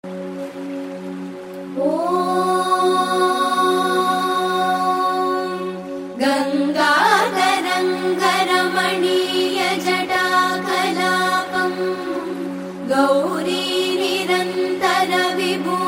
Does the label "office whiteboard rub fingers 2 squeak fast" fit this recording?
No